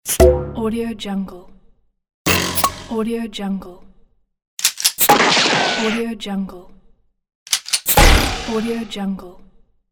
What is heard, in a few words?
comedy strike; comedy strike pack